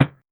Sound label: a thing put in an, inventory